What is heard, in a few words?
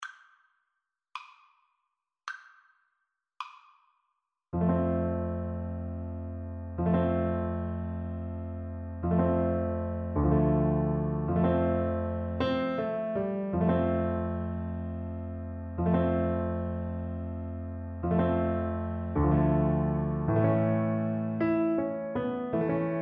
French Horn